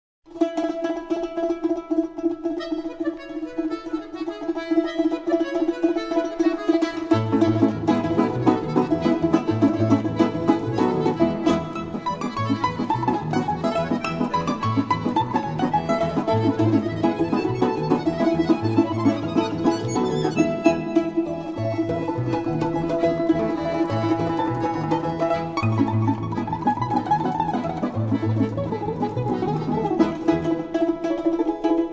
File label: balalayka, nach skazki